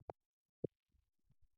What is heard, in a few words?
Spaceship Interface